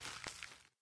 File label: Bush Sound